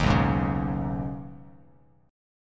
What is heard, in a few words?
B 6th Add 9th B down Bass Standard; B6add9 strummed; chord shape; JGuitar